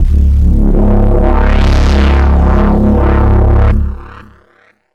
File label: NRG Bass, Trance